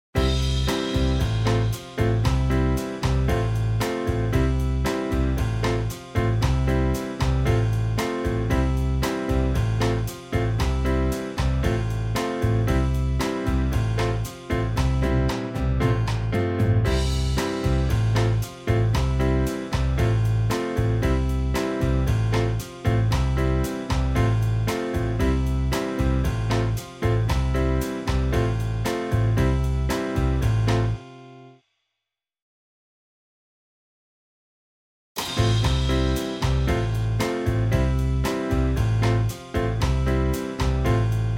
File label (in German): Navajo Stone Game - Playback normales Tempo